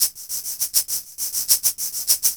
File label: Shaker Echo; Sonic Foundry (Sony Creative Software); Ambient Atmospheres & Rhythms